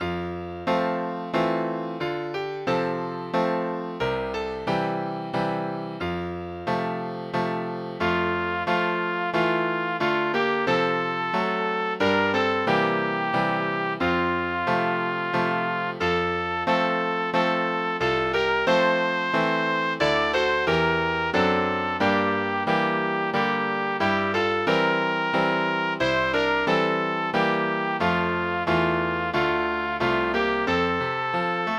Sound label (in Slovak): Ach synku, synku, pre trúbku a klavír